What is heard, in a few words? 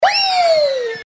weeeeyeye